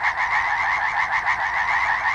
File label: FROGS; smash